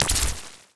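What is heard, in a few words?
elec wand, attack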